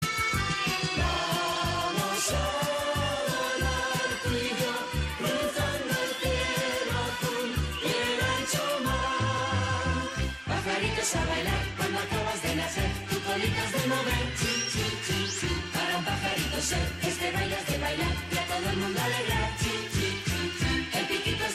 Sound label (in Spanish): ACORDEON